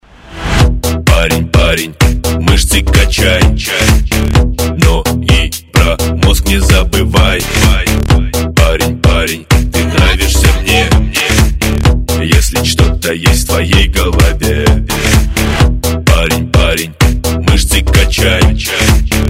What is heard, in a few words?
synthetic sax